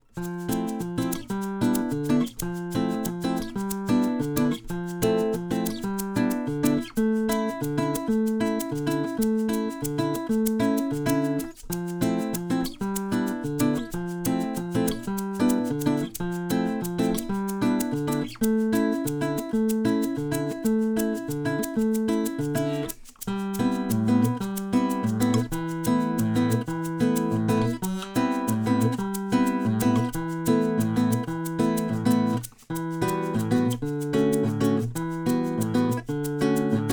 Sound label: Evening Bossa; Labor Day